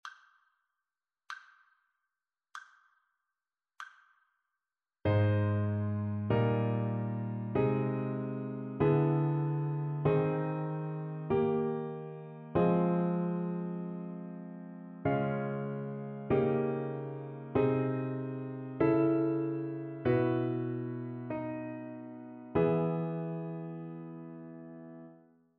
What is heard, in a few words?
harmonic dictation; eartraining